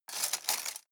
cutlery plastic multiple drawer grab hard

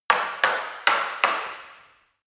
staff wood fighting sequence short

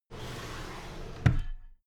drawer cabinet paper close regular

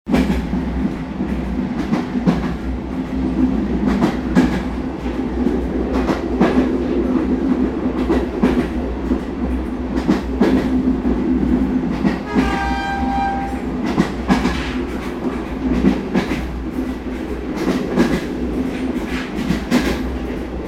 train accelerating

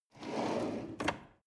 drawer medium close draw short

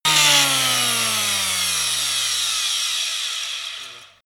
saw stop

